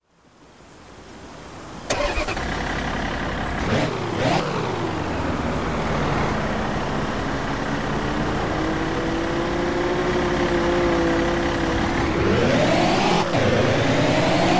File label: bmw 530d